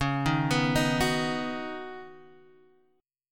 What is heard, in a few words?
C sharp 7th Suspended 2nd Suspended 4th C sharp down Guitar Half Step Down; C#7sus2sus4